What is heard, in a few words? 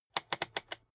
rotary control turn hard